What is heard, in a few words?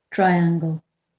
triangle